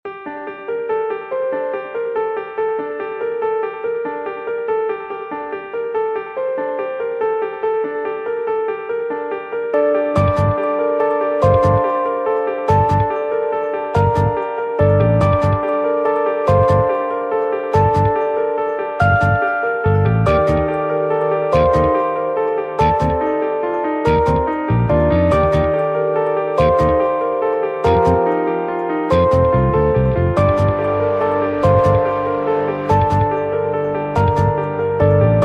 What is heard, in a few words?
questioning (moderato)